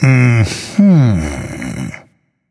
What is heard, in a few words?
nv thinking; cupid